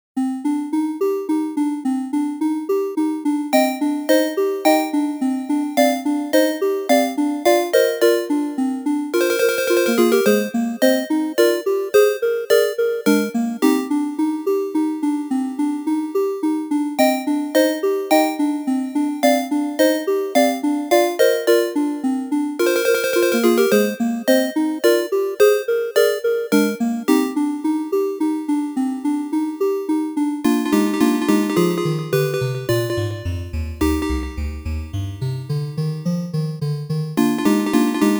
bgm title; Buff Knight

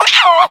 bug; bug catcher

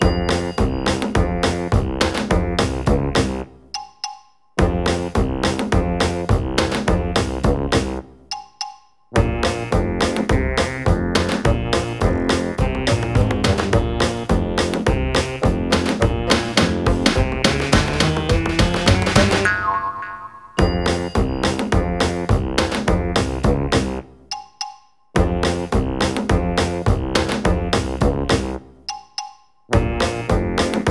funny loop; Mana Khemia 2; PS2

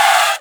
tire squeal; Spanish

